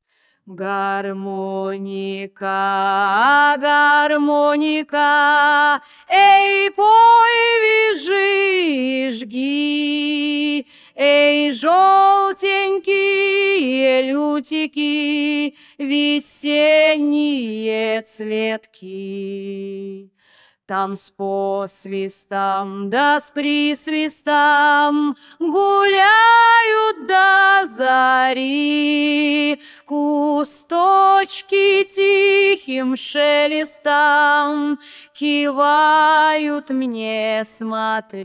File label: Harmonic